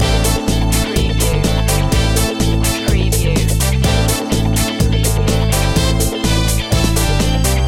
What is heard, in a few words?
disco funk loop